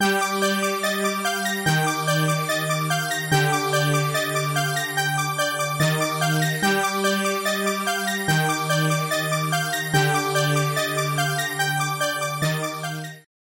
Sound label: LEAD Fusion Power Saw; Synthelium; syntheway